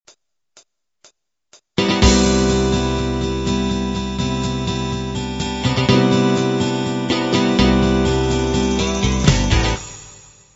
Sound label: light rock intro